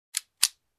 Pulling slide on pistol

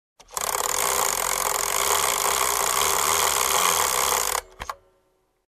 Clock gear roll

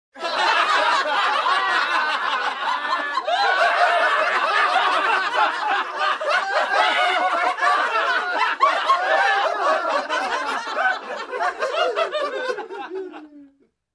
Crowd Hysterically laughing

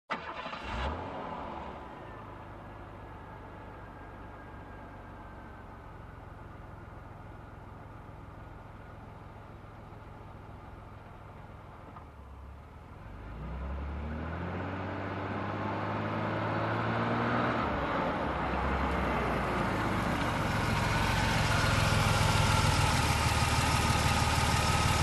Car approaching and parking

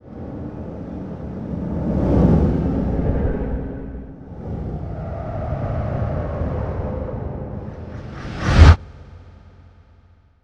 Vehicle driving as object swooshes by

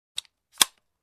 Handgun cocking sound

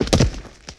Body Falls on a dirt surface

